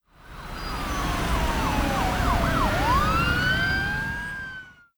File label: POLICE